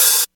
Present Open Hi Hat One Shot C# Key, Royality free open hat tuned to the C# note